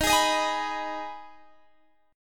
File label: Eb Suspended 4th Sharp 5th, Guitar, Open G